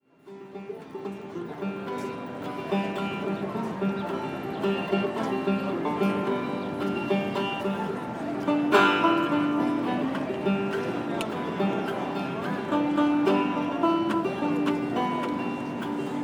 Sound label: banjo